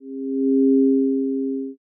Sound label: zero g